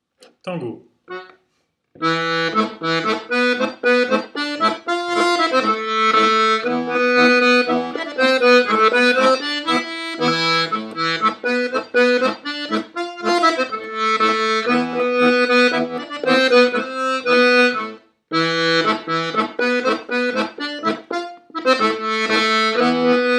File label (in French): Tango, accordeon